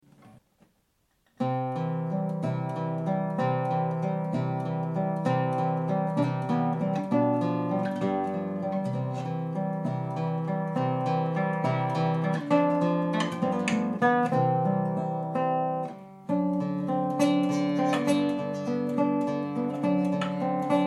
Classic Living room recording No sound effects no equalizing, guitars; DAT recording by Silvestre Borras